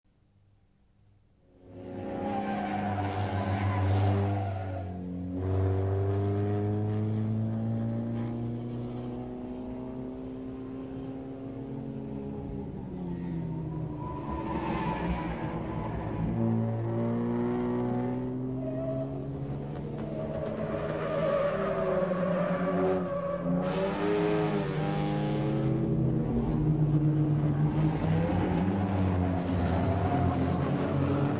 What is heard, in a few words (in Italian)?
PASSAGGIO AUTO DA CORSA